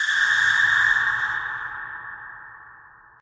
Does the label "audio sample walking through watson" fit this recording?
No